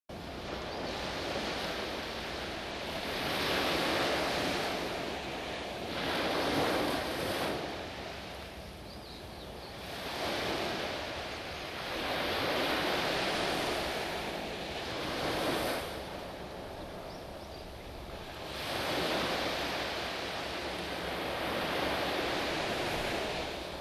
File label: wave